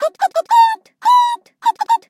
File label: Burning; Neru; SM64 CO-OP; Triple Baka Pack